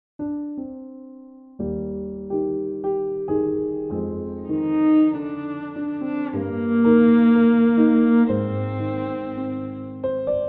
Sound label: Romance in E-Flat Major for Piano and Viola